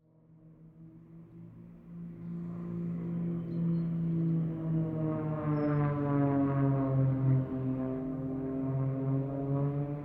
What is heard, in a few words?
aircraft extra flugzeugbau ea300 x4 airshow acrobatics close by